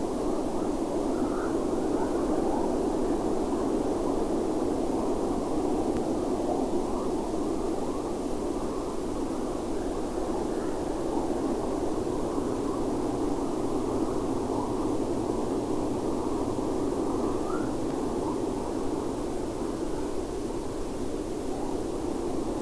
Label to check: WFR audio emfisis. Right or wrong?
Right